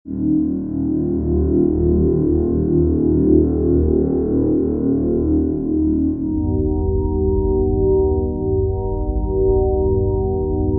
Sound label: drone; archeology